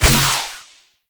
goob fire; Quake 4 Alpha; id software; weapons; goobgun

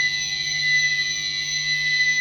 tone lock; Quake 4 Alpha; id software; turret

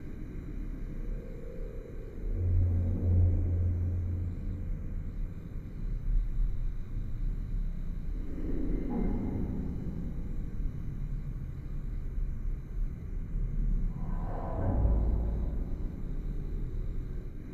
Hell Knight Amb LOOP Right

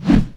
swing; Quake 4 Alpha; strogg tik

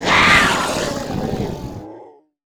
imp die, monsters; DoomRTX; imp5

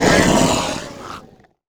imp die, monsters; DoomRTX

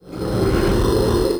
pinky chatter, monsters vocalizations; DoomRTX

